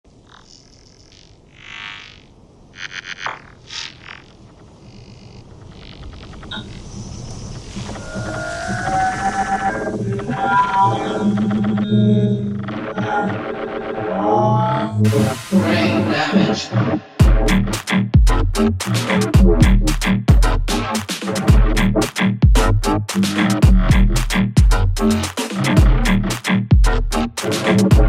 Experimental Hiphop Music

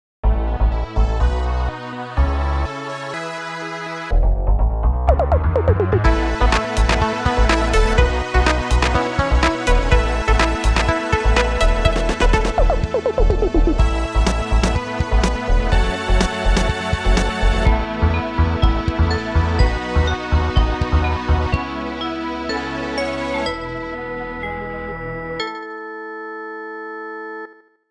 Fanfare; World of Goo 2; Paper Goolf

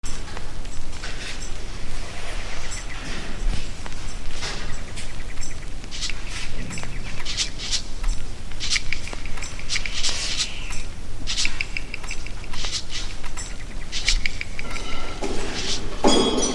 Ambient Lofi Music

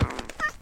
fat bat die; Clicker Heroes

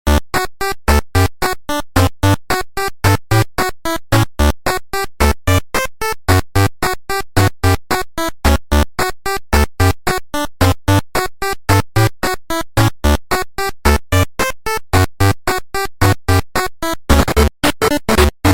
8-bit clouds

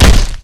fists hit; Garrys Mod

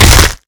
gore hit; killmove; Garrys Mod

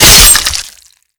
slash; Garrys Mod

